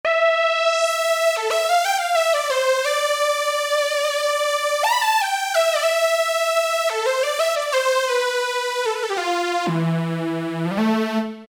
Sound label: Lead, Alesis Micron; AquamarineLead; Factory Programs